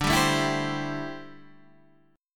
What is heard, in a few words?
D 7th Suspended 2nd Suspended 4th D